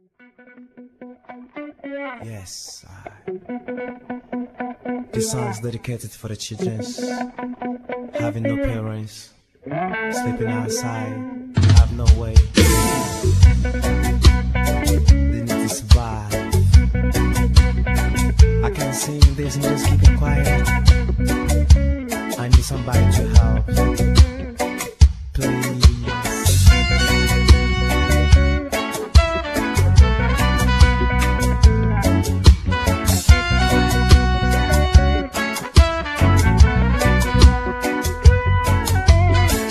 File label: latin reggae